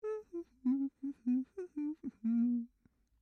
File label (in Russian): gde chelovek napevaet sebe muzyku s zakrytym rtom